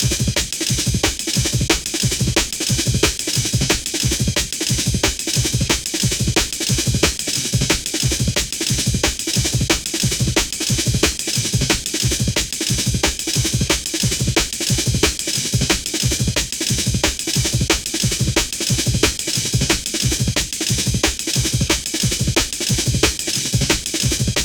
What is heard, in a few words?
amen break jungle 180bpm